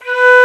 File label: Flute p B2; Digital Sound Factory Virtuoso